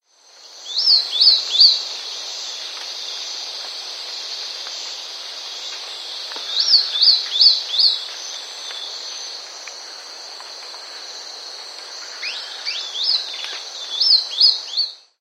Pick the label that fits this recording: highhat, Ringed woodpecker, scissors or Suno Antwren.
Suno Antwren